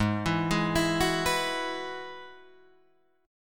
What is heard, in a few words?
Ab Minor 7th Sharp 5th Ab, down, Guitar, DADGAD